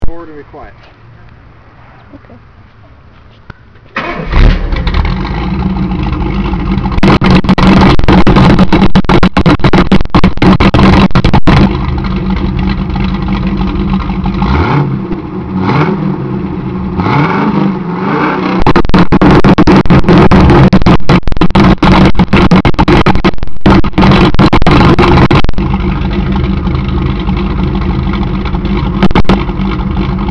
71 TA Exhaust sound

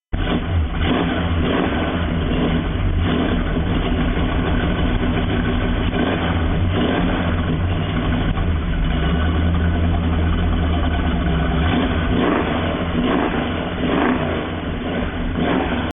superbird, exhaust

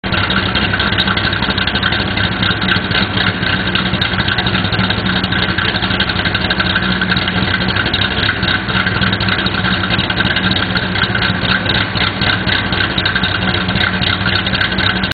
super bee, exhaust systems

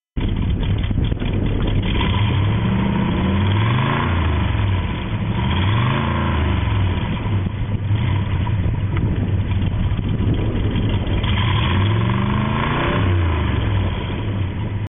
65 GTO, exhaust systems

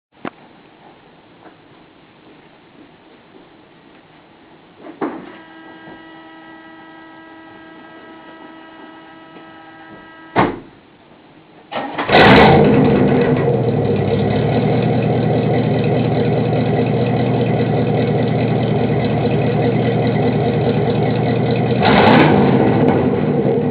69 chevelle chambered, exhaust systems

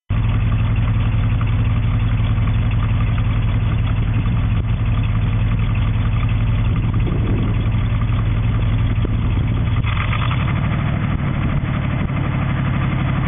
70 Chevelle, exhaust

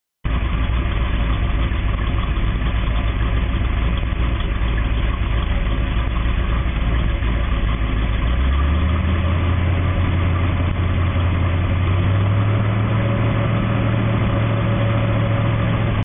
67 GTO, exhaust systems

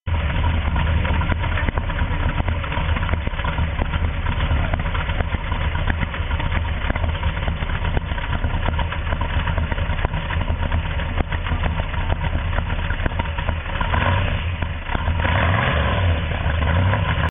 69 Judge, exhaust systems

